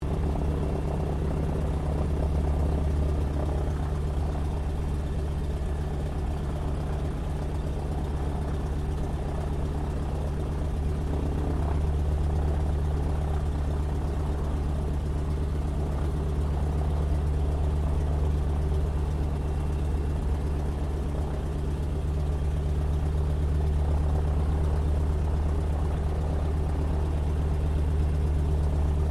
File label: AIRCRAFT BOEING PT-17 STEARMAN TAXI INTERIOR; PT-17 Stearman Trainer; Airplanes; Sound Effects:Vehicles